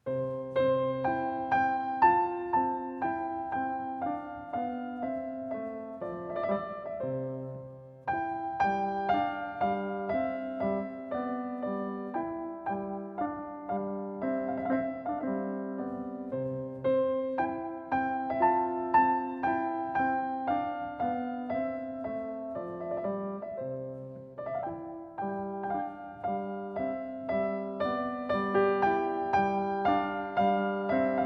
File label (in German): klavier mozart; patricia pagny; label novalis